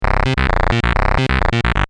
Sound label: BASS; mazda7